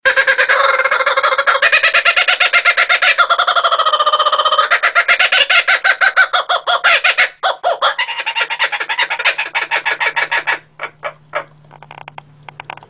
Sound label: Laughing Kookaburra